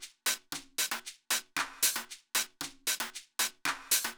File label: Drumloop 115bpm, Nu Disco; Zero-G